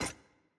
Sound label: Monkey Stuck Jaw Pin; Puzzle